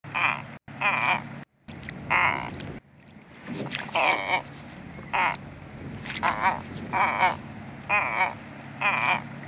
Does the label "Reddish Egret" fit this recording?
Yes